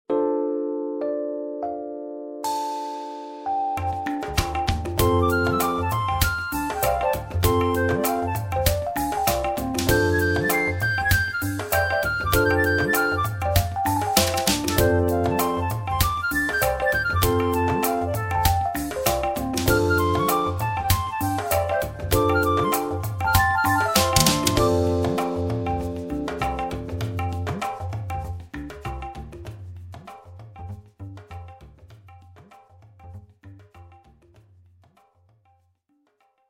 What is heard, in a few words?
tabla